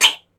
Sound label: spin